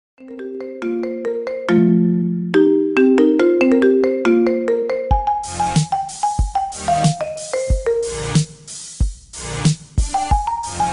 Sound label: marimba ringtone remix